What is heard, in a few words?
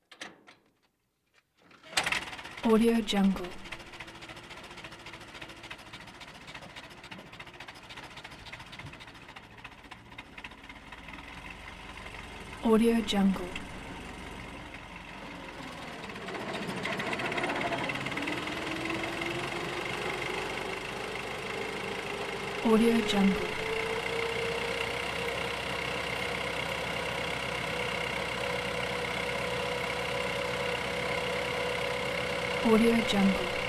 antique vehicle vintage; prosoundfx